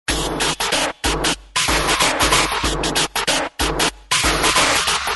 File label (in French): Scratch musical